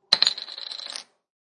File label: Plastic Bottle Cap, Plastic bottle cap clatters on counter; Clicks; Objects